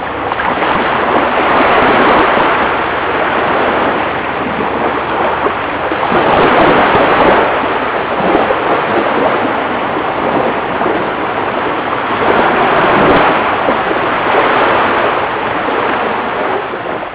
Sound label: WATER